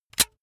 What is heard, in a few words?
razor retract comb fast; maschinen; rasierer; soundpool